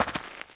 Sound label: step, Moves; ageofwonder